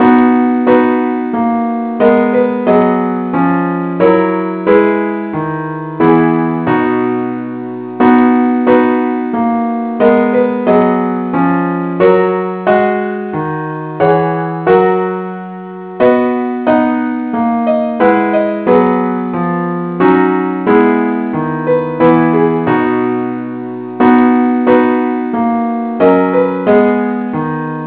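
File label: parts only keyboard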